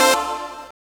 dance chord

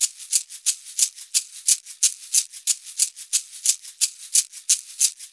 ETHNO PERCUSSION 90; ethno2

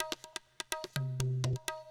PERCLOOP ETHNO 125

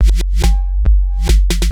DRUMLOOP TEKNO 140; Techno2

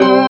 ORG, DANCE ORGAN

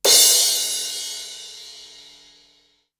CRASH, CYMBAL; drum

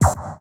One Shot, Hits; Touch Loops; ElementsOne; Abstract Rhythms

